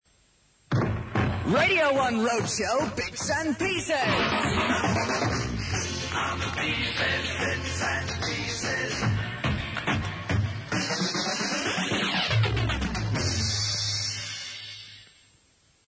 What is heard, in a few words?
bits & pieces jingle